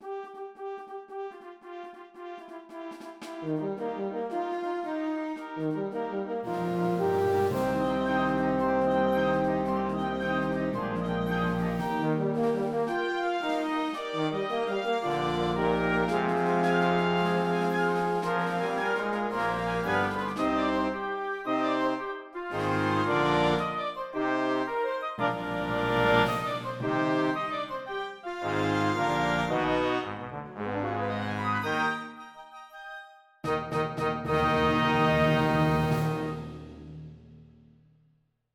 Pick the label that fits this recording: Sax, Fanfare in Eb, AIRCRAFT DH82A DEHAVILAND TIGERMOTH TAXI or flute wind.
Fanfare in Eb